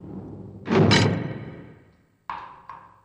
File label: Jail Cell Door Swing; jail & prison doors